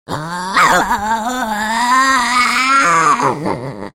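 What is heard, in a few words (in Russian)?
pantera golos, panther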